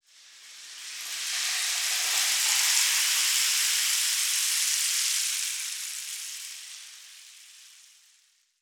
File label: RAINSTKS, RAINSTIKS; Spectrasonics; Heart of Africa 1